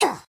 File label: pain sharp; soldier; source engine; tf